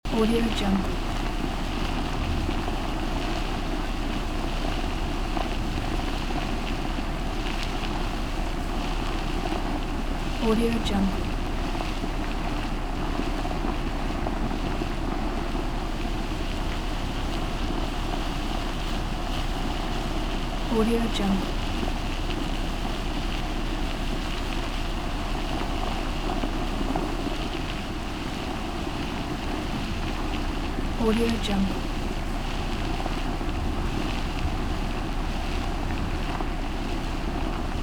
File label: hot volcano magma flowing loop